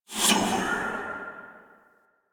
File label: Fire Whispers; Warframe; Tenno; Grimoire